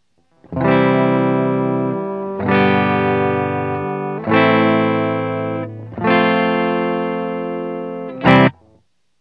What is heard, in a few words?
clean